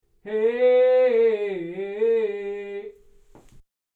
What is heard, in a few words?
Inside Isovox Booth Absorbing Test